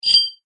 sound design metal chain rattle tone; Metallic Chain Rattle Style Tone ( Sound Design ); Electronics; McKinney Sound

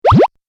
8 Bit Style Slip; video game

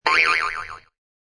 A single “boing” sound.; Boing Sound Effects; Sound Effects:Cartoons

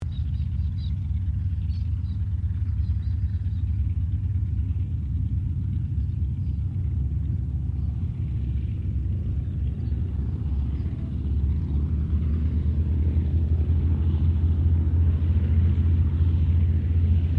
AIRCRAFT CERES WINJEEL WIRRAWAY TAXI DISTANT; Mixed aircraft squadrons; Airplanes; Vehicles

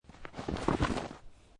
Snow And Ice Snow Movement; Weather

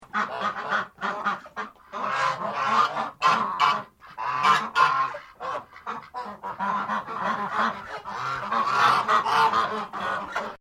Bird Ducks Many Less Noisy, Australian Birds; Animals

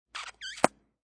Squeal Gum Pop

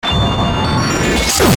Glitch Ascend Synth Warble; glitches; Blastwave

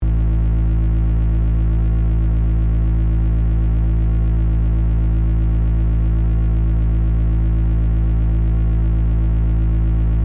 Force Field Energy, Source; Loop, Science, Fiction; Sci Fi Sound Effects

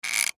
Lever Squeak; Cartoon

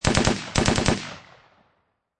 Machine Gun Ak-47 Short Burst Double; Machine Gun Sound Effects

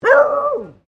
ANIMAL DOG BEAGLE FEMALE BARK; Various domestic dogs; Animals

